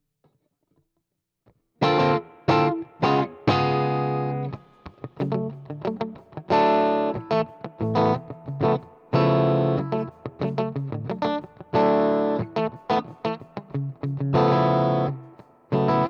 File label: GTR R84